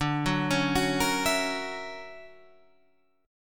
D Augmented Major 9th down Guitar Half Step Down

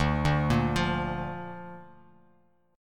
D Minor Sharp 5th D down Bass Standard; Dm#5 arpeggiated; JGuitar

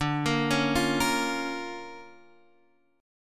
D Minor Major 7th Sharp 5th, D, Guitar, Drop D

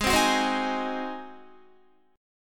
Ab 7th Ab Guitar 4F,4A,5C,5G,5C,6E; Ab7 strummed; 15,15,18,17,15,16

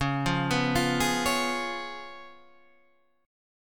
C sharp 7th C sharp, Guitar Drop D

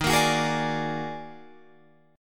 D sharp Minor Major 11th, Guitar, Drop D

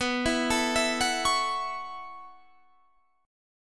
B 9th Suspended 4th B down Guitar Standard; B9sus4 arpeggiated; capo=3

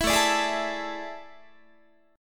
A Major 7th Suspended 4th, Guitar

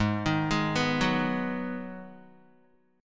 Ab Minor 7th down Guitar; arpeggiated; JGuitar

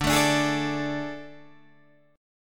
A Major 7th Suspended 4th D

